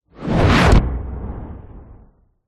magic spell whoosh ball of energy imcoming hit thud; fantasy